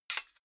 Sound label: knife hit metal